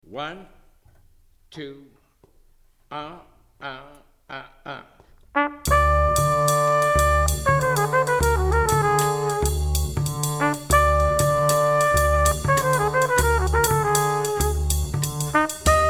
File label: minus piano